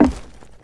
footsteps, wood; player

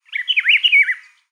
bird, ambient animal

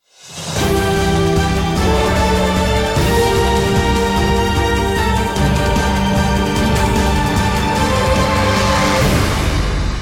bomb ten sec count; Counter Strike; CS2

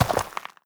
step light, footsteps, player, dirt

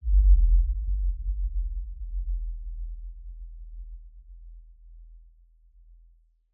amb cavern excavation lfe; ambient; Half Life Alyx

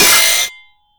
manhack grind; npc; Half Life Alyx

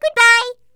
turret launched; npc; Portal 2

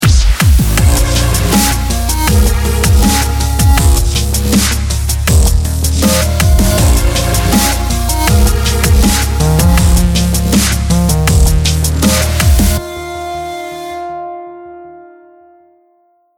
start action, music

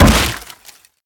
wood plank break

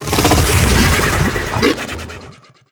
idle out, barnacle; Half Life Alyx

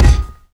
physics cube md; Portal 2

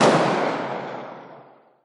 m249 distant; weapons; Counter Strike Model Pack; CS2

